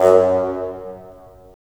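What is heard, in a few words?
wind bassoon; LA Composer vol. 3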